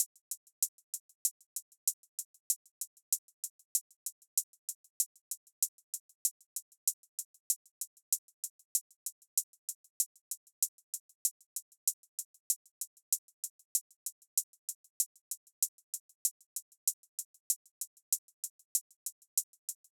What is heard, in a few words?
Drum Loop, 96 BPM Hihat; Loop Stems; Cymatics; Vibes II Drum Loop Collection; Forgotten Drum Loop